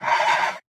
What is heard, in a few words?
Death; Folk Hero